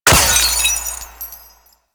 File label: Infection Destroy; Cephalon Tear; Warframe; Cephalon Weave